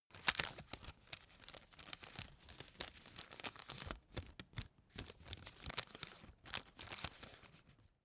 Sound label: cigarette rolling paper handle